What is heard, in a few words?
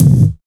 KICK; East West - Phat & Phunky